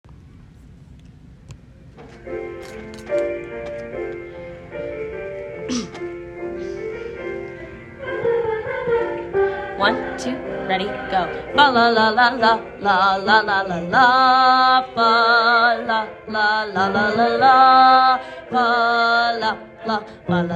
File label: bass